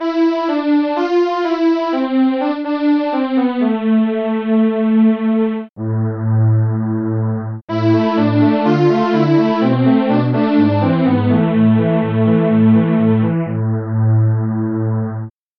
polysynth

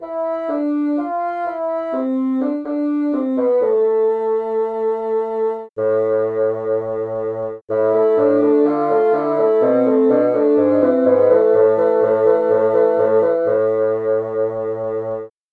bassoon

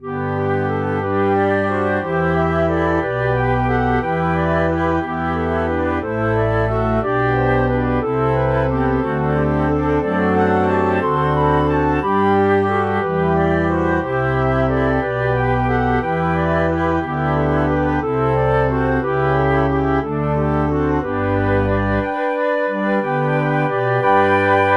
flute

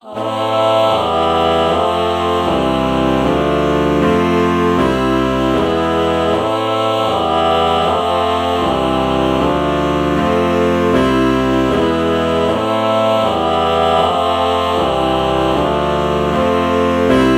alto saxophone ah chord morph

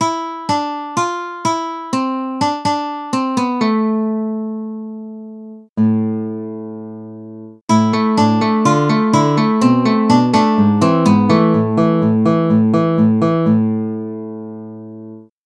nylon string guitar samples

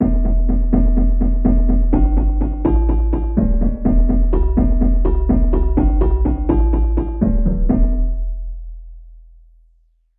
celesta bass; SpectMorph Evaluation #4

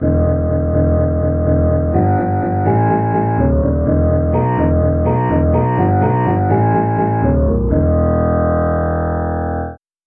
sitar bass

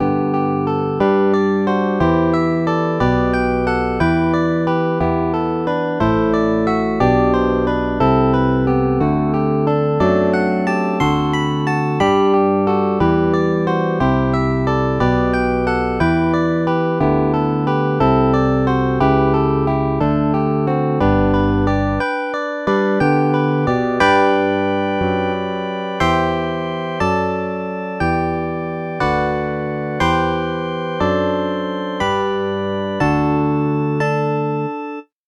fingered bass

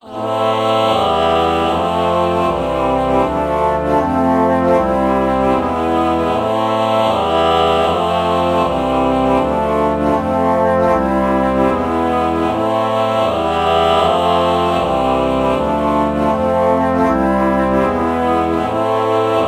alto flute ah chord morph

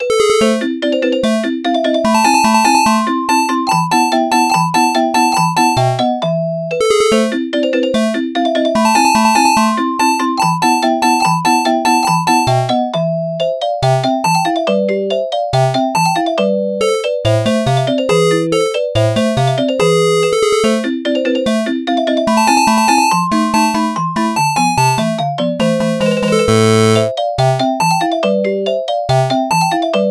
crystal

